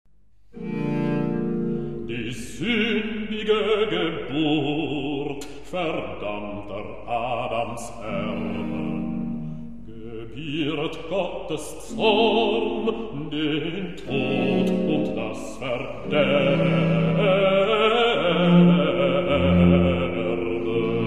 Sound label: Recitativo Basso; O heilges Geist und Wasserbad; BWV 165; Die sündige Geburt verdammter Adamserben